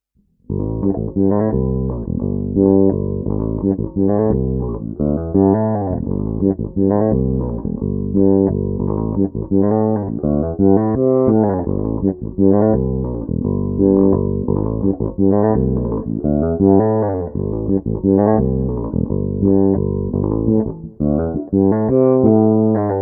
defretted

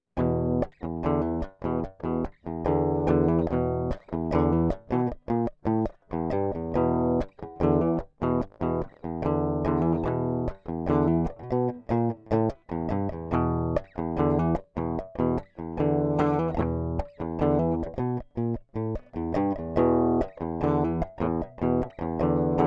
Dry guitar test

